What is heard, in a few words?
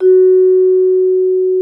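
vibraphone F#4; Xsample VOL-4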